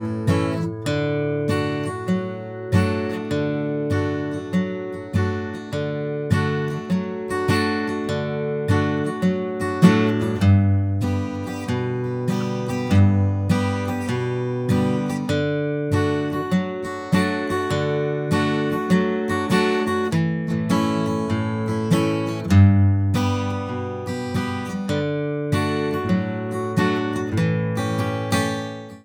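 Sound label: Acoustic Guitar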